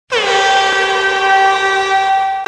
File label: Soca horn; Horns